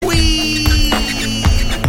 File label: Punch; Mike Tyson Punch Out